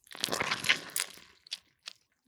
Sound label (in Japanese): 射精音